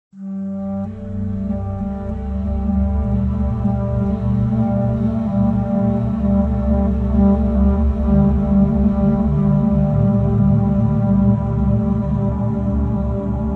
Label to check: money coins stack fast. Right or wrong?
Wrong